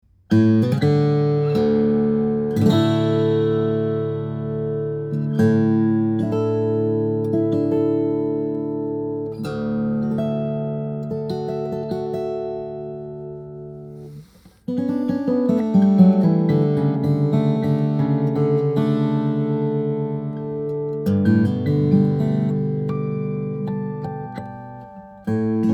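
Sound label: guitars, steel-string guitars, flattop; mcpherson mg-4.5 xp; madagascar rosewood engelmann spruce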